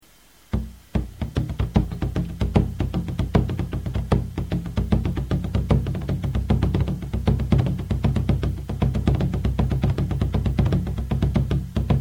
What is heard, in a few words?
boing